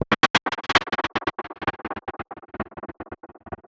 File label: Gated Cymbal; musicradar